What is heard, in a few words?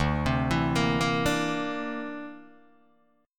D 7th Suspended 2nd Sharp 5th D, Guitar DADGAD